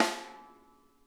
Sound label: SNARE; Sonic Reality; Interactive Drum Kits